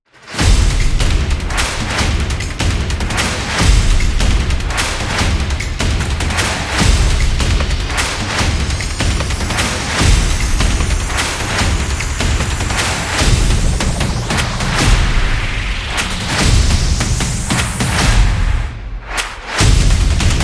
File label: Massive Tribal Beat